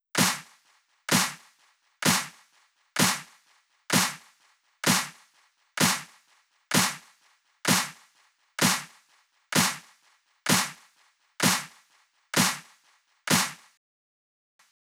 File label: Drum Loop 128BPM SN; Loop Elements; VEC5; Drumloops; Multi Loops